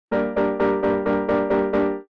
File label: Old vintage cartoon accent